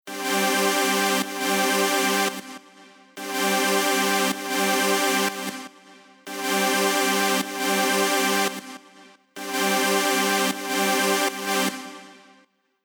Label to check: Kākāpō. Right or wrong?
Wrong